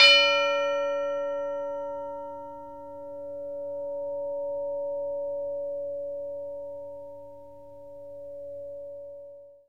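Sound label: CHIME C5; Synclavier Percussion; World & Orchestral; ILIO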